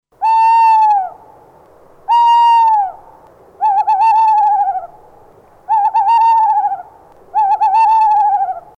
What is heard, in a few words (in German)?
waldkauz